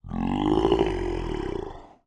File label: Growl Monster Effort; sea monsters; TheLibrarybyEmptySea; TheLibrarybyMTC